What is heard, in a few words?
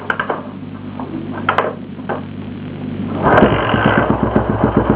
kick